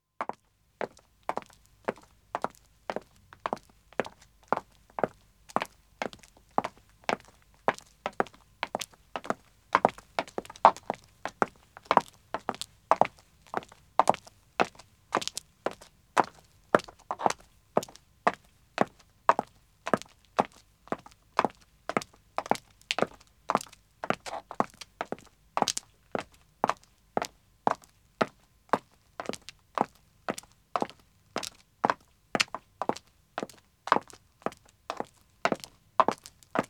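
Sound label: Pavement Walking 2 women start; Footsteps; BBC Sound Effects Library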